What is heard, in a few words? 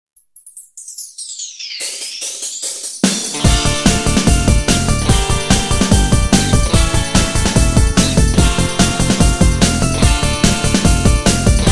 instr